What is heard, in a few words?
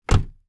car door close